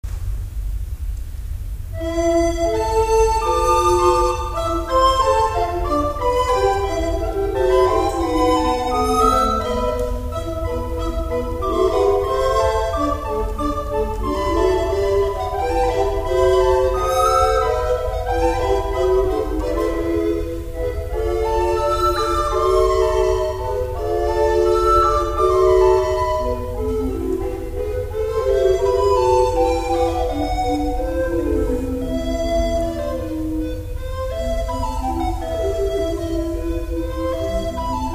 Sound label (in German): 12 Floeten